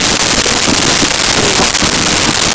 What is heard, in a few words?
Tune FM Radio; Communications